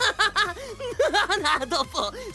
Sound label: funny laugh; Hifumi